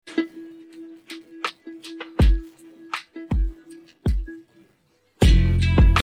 no vocals; чел, иди в роблокс играй

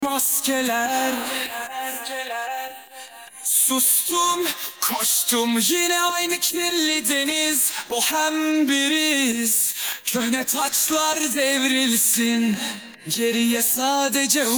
vocals

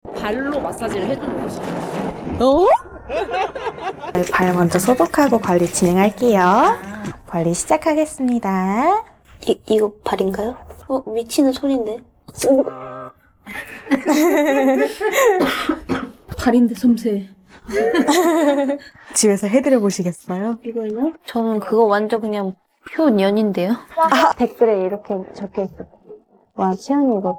vocals

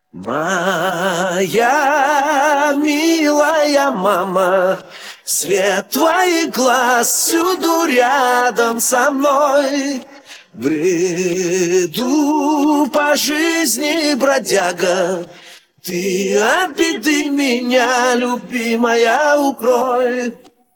vocals

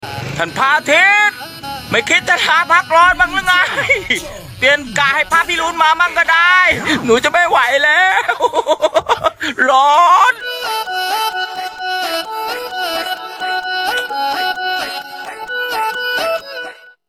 vocals

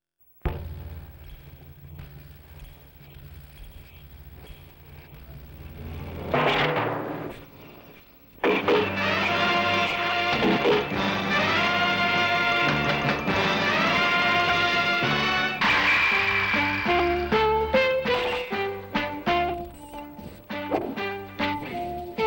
no vocals

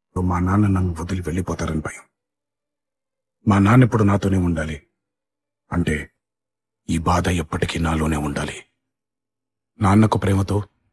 sad vocals

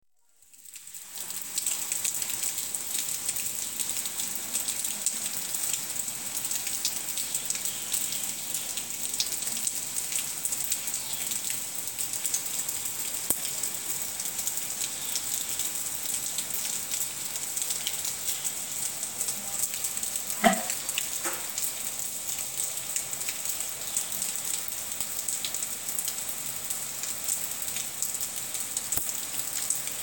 hujan no vocals; hujan 1

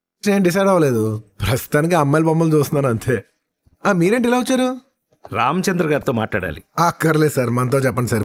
happy vocals

